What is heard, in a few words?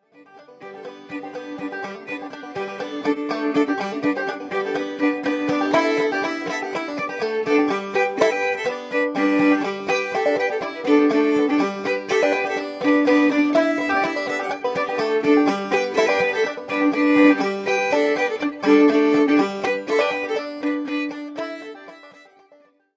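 banjo, acoustic string band, recorded live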